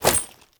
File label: Generic Foley; Warframe; Uriel